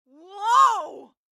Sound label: car reaction; Blind Drive